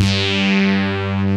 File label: SAW LEAD; Pro-Rec - Synthsonic; TRINITY